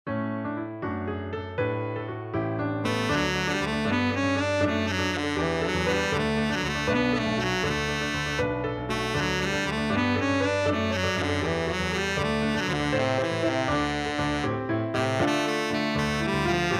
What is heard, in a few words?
song piano with guide; Little Mermaid; Eric